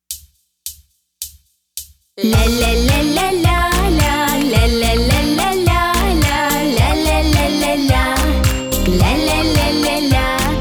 instrumental

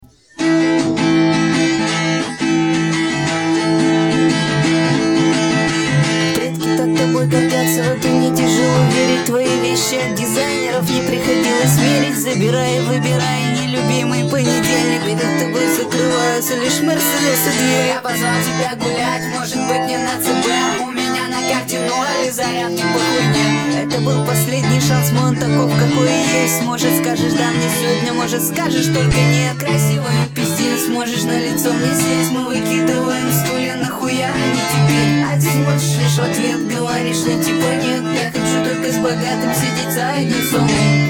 acoustic

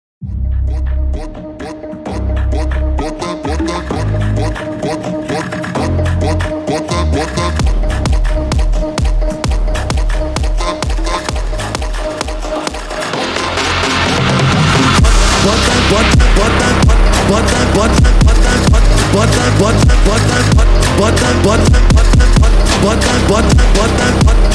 brazilian sigma phonk

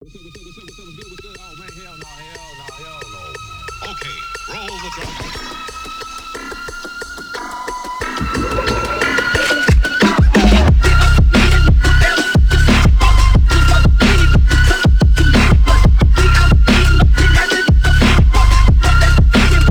Military Phonk

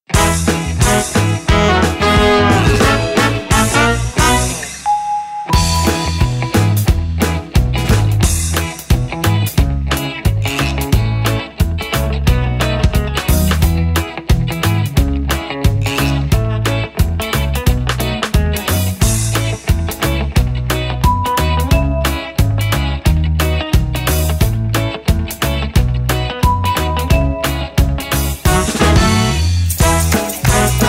instrumental

